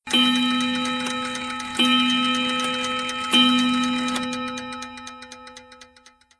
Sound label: clock Chimes; ClassroomTimer